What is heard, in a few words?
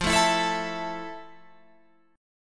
F Major 7th, Guitar, Open F; strummed